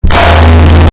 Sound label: shwomp